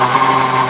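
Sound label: storm rain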